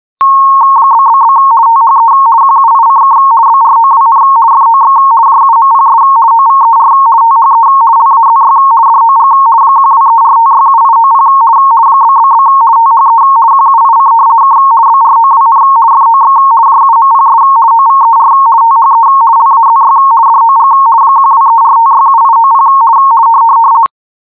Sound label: radio audio rtty 170 50